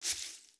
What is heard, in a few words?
FS Grass, footsteps